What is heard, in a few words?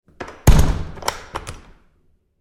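Door Bathroom Stall Close close-up Med with Lock; Door,Bathroom Stall,Close,CU,Med w-Lock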